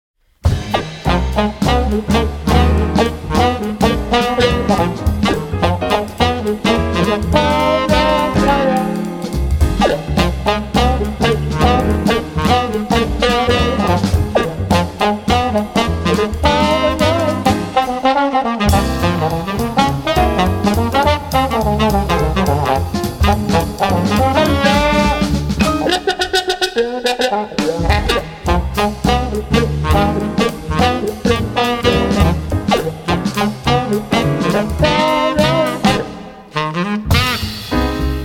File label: Rhythm Cone